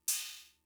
Cymbal, Fricke MFB-522, Drum Machines

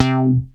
Bass Synths hits and octaves

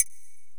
Korg x5, Drums

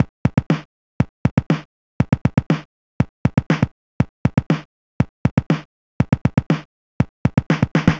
Apple II Elektro Beat, Drums, Chiptune; Sonic Foundry (Sony Creative Software)

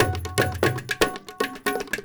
INDIA L; Spectrasonics - Heart of Asia CD2

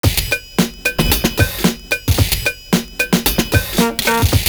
KLAXON BEAT; Univers Sons - Kitch 70s

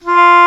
Eng Horn mf F3; Digital Sound Factory Virtuoso

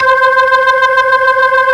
B3 LO DIST, ToneWheel Organ; E-MU Formula 4000 Series Vol. 3 – Analog Odyssey

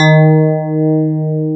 PIA DX E-PIANOS

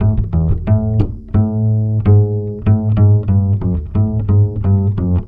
BASS, Balkanish Traditions

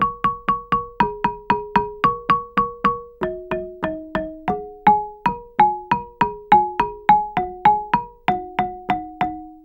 GAMBANG PH; Spectrasonics; Heart of Asia

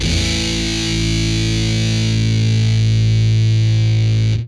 POW CD FUZZ, Guitar; Quantum Leap

